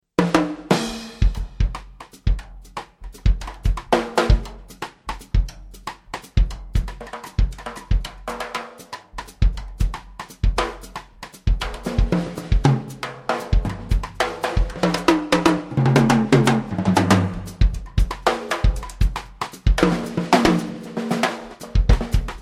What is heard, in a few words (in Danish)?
tromme; trommesaet misti db52